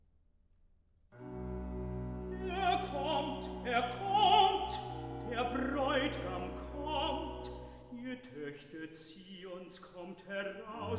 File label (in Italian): Recitativo (Tenor); Er kommt er kommt; BACH VOCAL; CANTATAS; BWV140 Wachet Auf; DGG